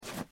Pick Up Objects; Granny 3; Android

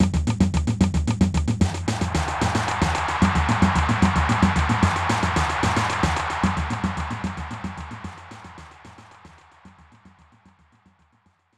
Goal; J League Soccer Prime Goal 3; SNES

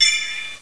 Worker Explode; 7 Wonders of the Ancient World

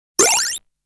shooting sound

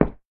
Tile short

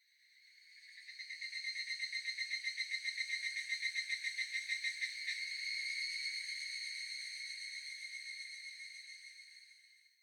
Ambience Cicada; The Inn

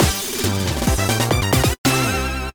Jingle You Win!!; bomberman 2; nintendo ds

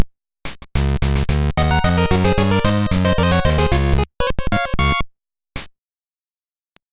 Level Completed; toki tori; gb

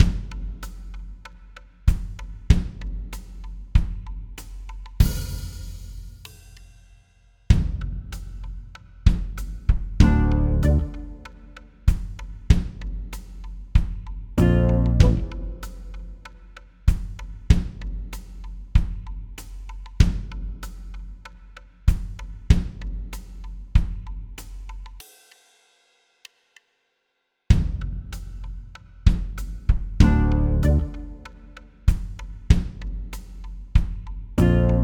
Walking Base Loop; bakugan champions of vestroia 2020; switch gamerip

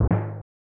Timpani; bs satesupudx 4; snes

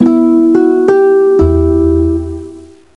Celtic Lose; mystery tales time travel 2010 nds gamerip

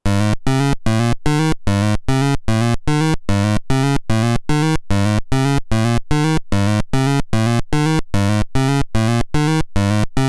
Walking Sound; BeroBero

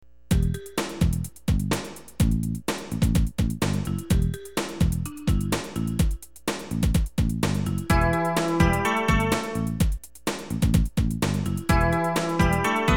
Railroad Rap; SimCity 2000; psx

